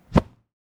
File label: block from hit